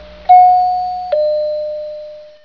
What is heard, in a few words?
chime; SFMuni; bayarea